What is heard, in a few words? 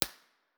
koli snow, koli national park winter